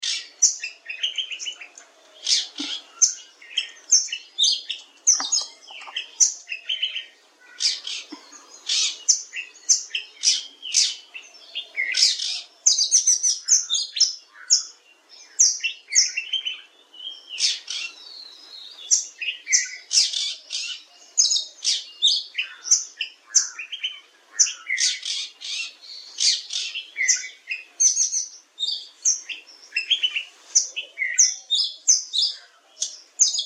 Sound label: Oriental Magpie Robin